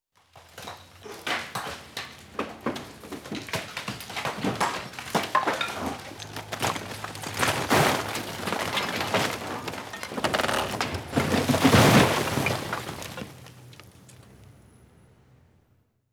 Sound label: Building Collapses; Emergency; BBC Sound Effects Library